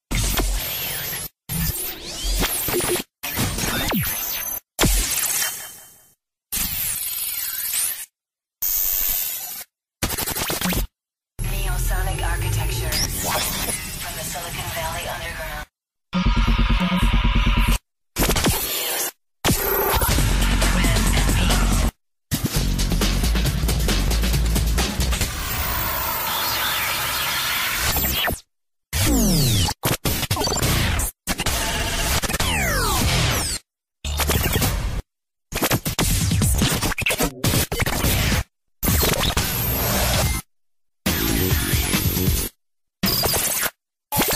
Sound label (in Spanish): Efectos De Sonido Tipo Laser Para Radio